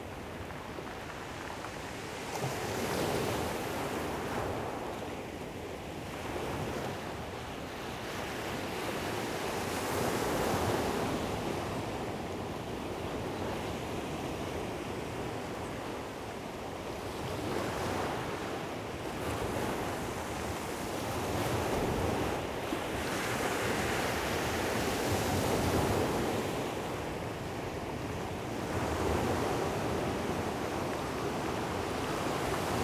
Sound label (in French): plage de la Verne; B et K